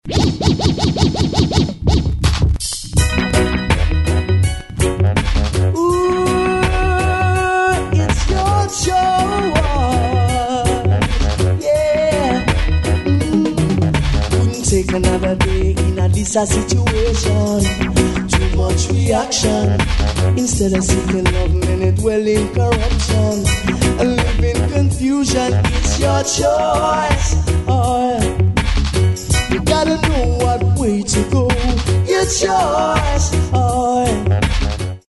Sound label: SAX